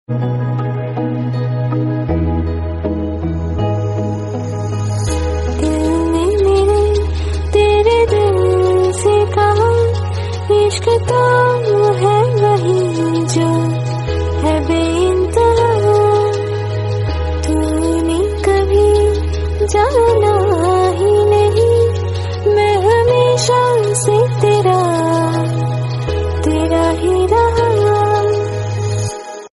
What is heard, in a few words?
Female Version